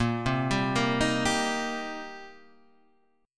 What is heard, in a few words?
C Minor 7th Bb